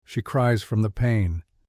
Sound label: pains